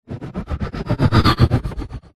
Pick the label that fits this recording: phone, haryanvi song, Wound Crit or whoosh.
whoosh